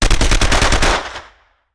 Machine Gun; Sound Ideas; Guns

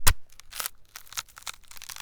Needle; Deis

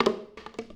Hit Plastic Popcorn Bucket; SFX Kit; Foley - Misc

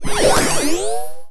ship turbo; Space Wave Race

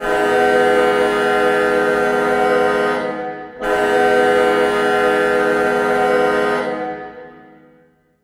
Hockey Goal Detroit; Hockey Horns & Buzzers

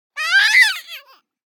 BABIES SCREAM Boy 12 months Very Short; Baby Boom

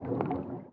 skid sea back; Blind Drive

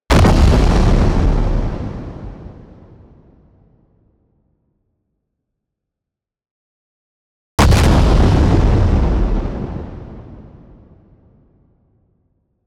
EXPLOSION LONG Low Rough Distorted Tail; boom library; Anime Essentials

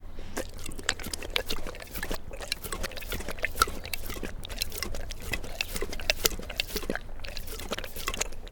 Female Bengal Tiger Gloats and Licks; Tigers; Sound Ideas

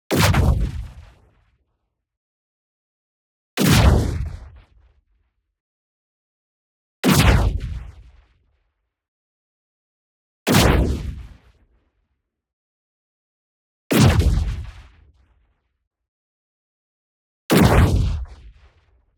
IMPACT LARGE Explosive Shot; boom library; Matter

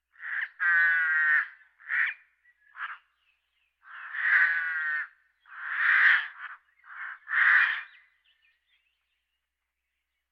Birds

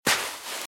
Footsteps - Sand - Dry - Walk - Left

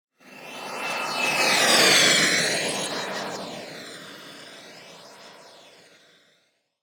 Jet By Processed - Passing Space Ship - Thin Jet; Hollywood Edge; sound designer's toolkit v2

